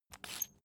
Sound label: salt mill squeak